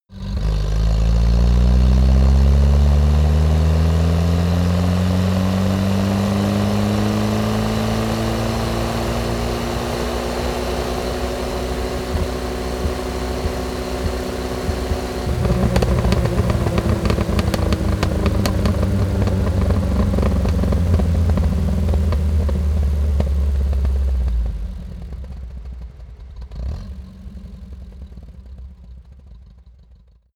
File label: car uaz 469 fast long acceleration deceleration